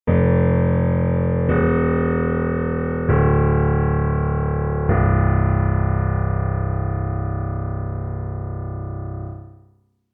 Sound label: inharm low